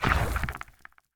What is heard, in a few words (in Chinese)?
脚步声; 监守者